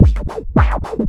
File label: FILTER, Big Beat Drumloops